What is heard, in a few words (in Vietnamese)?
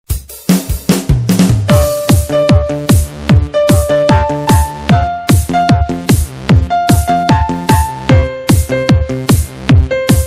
Nhac chuong DJ hay